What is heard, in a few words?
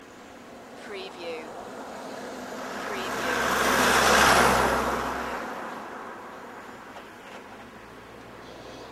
Car Driving By